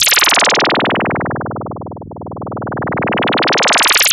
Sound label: LFO, Noise